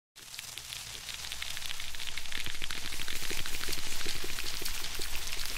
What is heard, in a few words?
spider creepy crawl